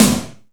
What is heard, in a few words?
FATS DRM, FM DRUMS; East West; Global Sounds